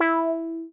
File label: meow